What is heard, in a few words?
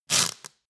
velcro undo roomy short